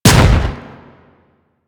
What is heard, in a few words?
Fire Shot, Weapons; Warframe; Boar